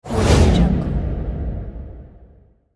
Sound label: cinematic whoosh; audiocellstudio